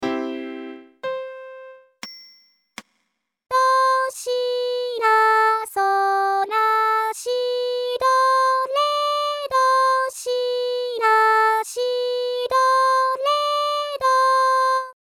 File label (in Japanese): ボーカル音声; コールユーブンゲン No.4 b)